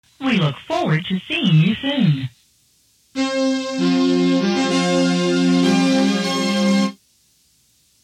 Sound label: Dusk Set Fanfare; New Life Minecraft